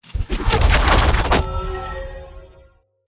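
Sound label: Siege Field Arrows Rain Impact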